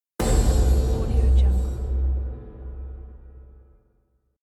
big hit

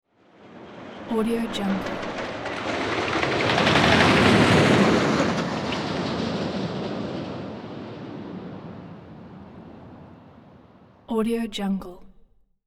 rollercoaster passes by fast; hollywoodedge

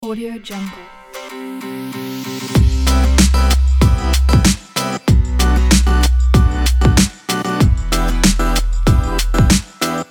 urban hip hop background

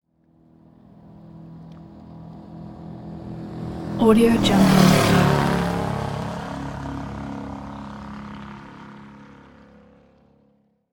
large powerboat pass by; sound ideas

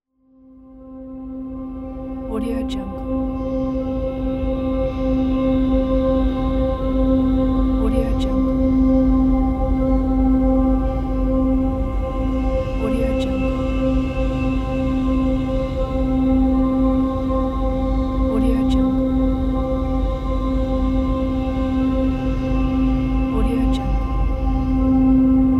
musical space drone

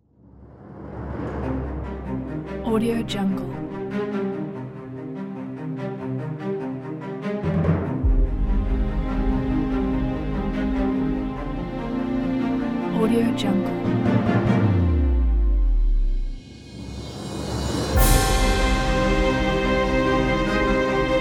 the epic trailer

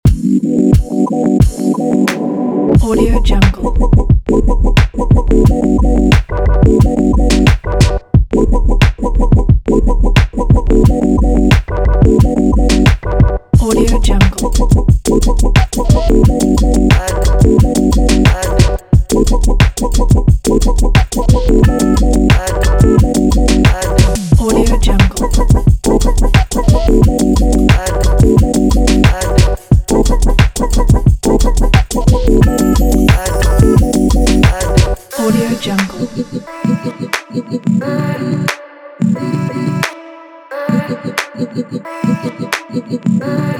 fashion hiphop

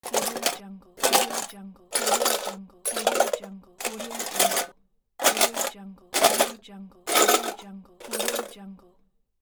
toolbox

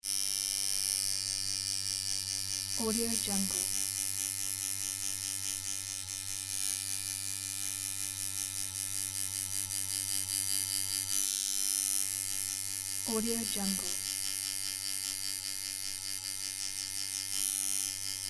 tattoo gun studio ambience

